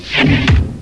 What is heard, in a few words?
fat thump